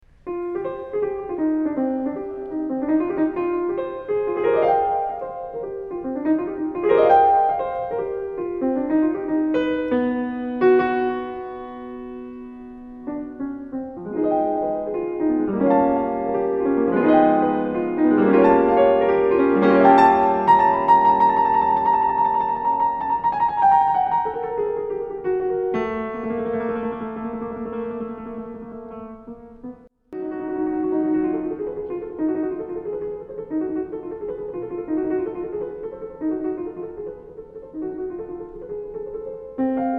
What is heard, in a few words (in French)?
preludes pour piano; DEBUSSY (Claude); livre 1